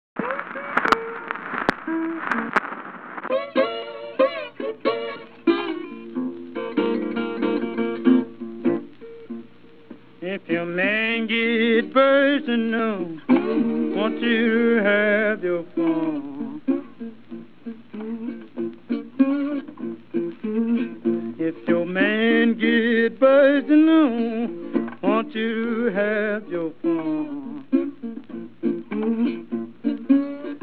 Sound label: Blues